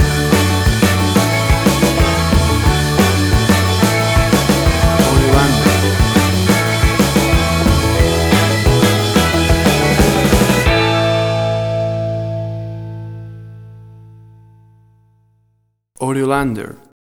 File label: Fifties Jukebox Moods